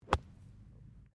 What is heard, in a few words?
Golf swing wedge bunker; Sports; Various Golf sounds